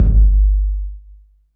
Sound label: GATED K808, GATE COLLECT; SPECL FX